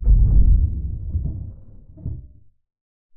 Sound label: Bang